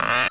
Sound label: fail creak